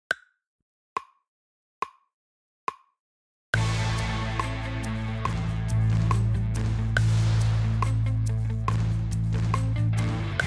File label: drumless